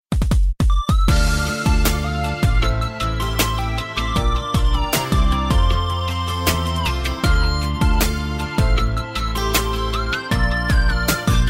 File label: beautiful melody, electronic ringtones